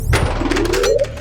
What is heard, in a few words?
blackout sound